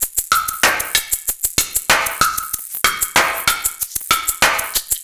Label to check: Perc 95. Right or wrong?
Right